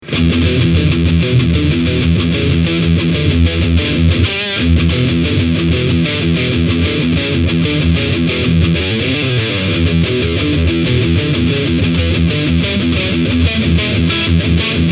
Full Gain Mid Scoop

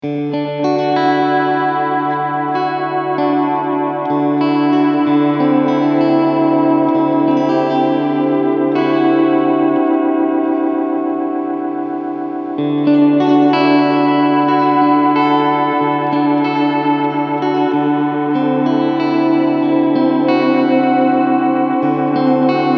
Texture

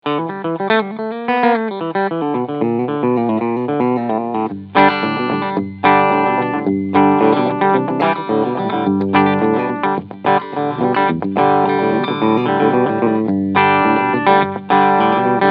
Yamaha Revstar cleansound Steg Humbucker; yamaha revstar rs720b; sc mode

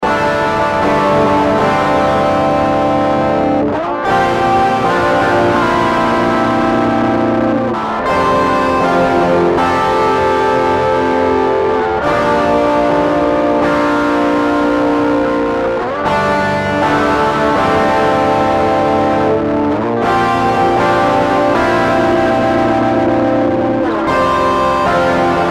nexi overdrive screamer

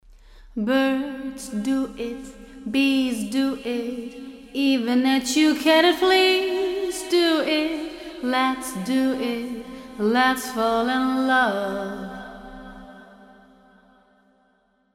Vocal Plate Echo

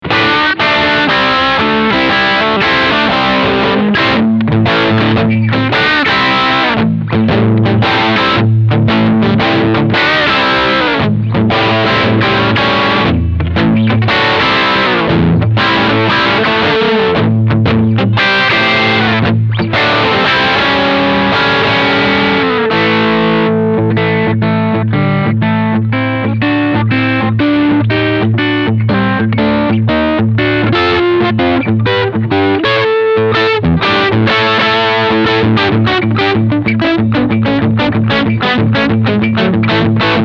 Crunch; Match

